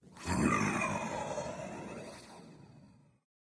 lobby victory vox; smite; thanatos

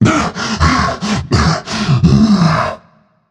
nv breath, vox; smite; voicepack; vulcan

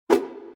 victory arrow; smite; chiron

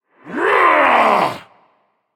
battlecry; cerberus

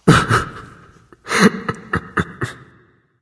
boohoo; chaac

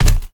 footstep; smite; god tiamat

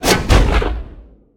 fire activate; smite; god merlin

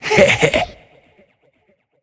hehheh; smite; tiamat

